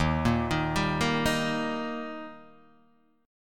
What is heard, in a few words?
D sharp Major, Guitar, Half Step Down